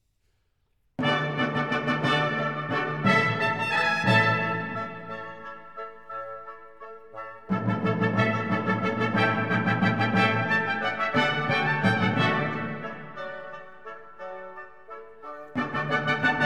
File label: Concerto in D Major for 3 Choirs Tempo di Menuet; Fasch